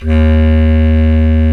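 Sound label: Bass Clarinet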